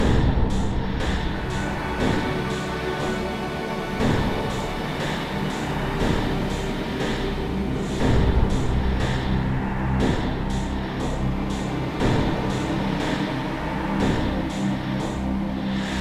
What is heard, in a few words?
Danger Tonal; Insanely Twisted Shadow Planet